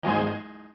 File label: combo; Puffer Popper